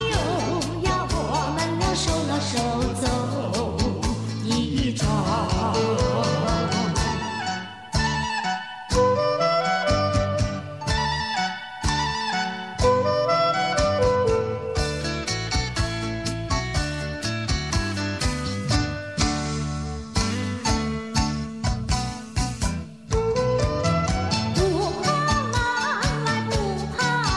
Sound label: music